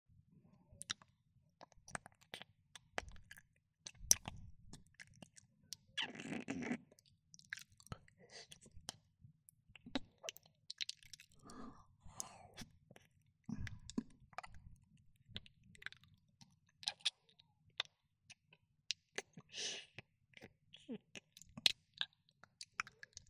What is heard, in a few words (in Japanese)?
エスカレートするエロい耳舐め